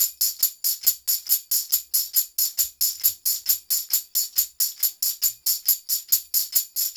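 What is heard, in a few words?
TAMB, tambourine; Drumdrops In Dub VOL-1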